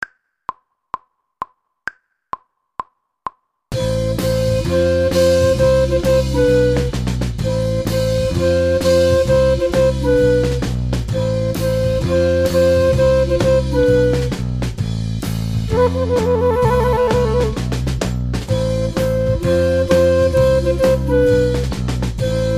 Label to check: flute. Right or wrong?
Right